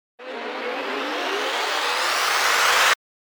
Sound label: RISER